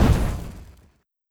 Impact Fire; Legend of Keepers (gamerip) (2019)

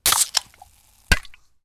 Open Cans Cheers

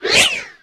Ui Rank Button; Superb; Nickelodeon Super Brawl Universe; Android

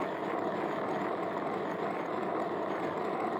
Roller Rolling

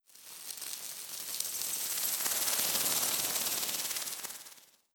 Spider Raid Insect

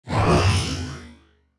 Teleport

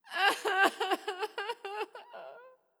Escape; Legend of Keepers (gamerip) (2019); Heroin Escape F

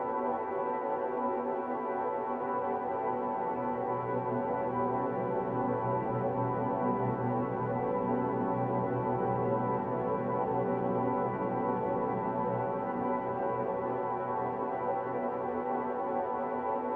Temple Loop

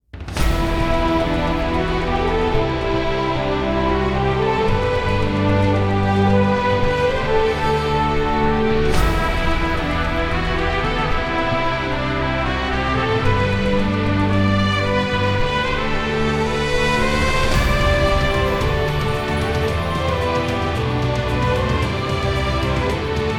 Event Suspense Light

